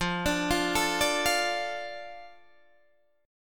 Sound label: F 6th F down Guitar Open D